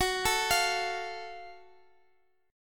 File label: F sharp Minor Major 7th, Guitar Half Step Down